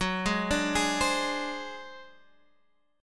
D 7th Flat 5th Gb, chord

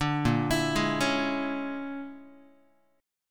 A sharp 7th Sharp 9th Flat 5th, Guitar; arpeggiated; chord; JGuitar

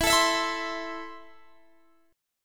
E Suspended 4th Sharp 5th E, down, Guitar, DADGAD

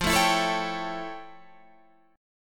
F Augmented Major 9th Guitar Standard; strummed; 13,12,14,14,14,15; down

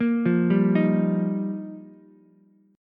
F 7th Suspended 2nd Suspended 4th, down, Ukulele; F7sus2sus4; arpeggiated; JGuitar

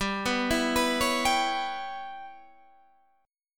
G 6th Flat 5th down, Guitar, Standard; G6b5 arpeggiated; JGuitar

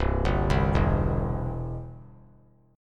C Major; arpeggiated; JGuitar

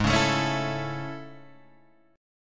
A Major G sharp, Chord

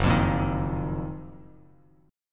F sharp Major 13th Bass Standard; strummed; JGuitar

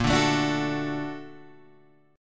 A sharp Augmented Major 7th; A#+M7 Chord {6 5 7 7 7 5} strummed

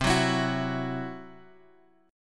C-Major Flat 5th, guitar; CMb5 Chord {X 3 4 X 5 2} strummed

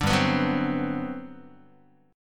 G Major 11th, Guitar; GM11 strummed; 5,3,2,4,4,x

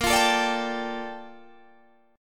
Bb Major 13th, Guitar, Open G

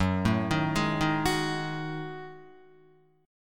Gb Minor 6th Add 9th Gb down Guitar; arpeggiated; JGuitar

